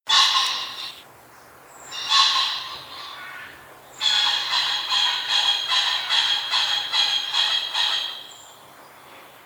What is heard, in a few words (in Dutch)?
fazant roep